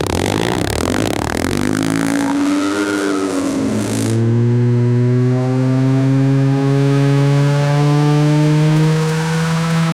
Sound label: the fly by of a formula 1